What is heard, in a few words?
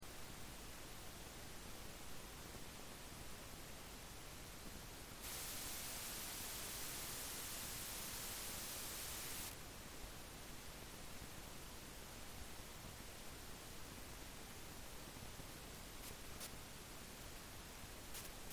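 LM386 filter out on off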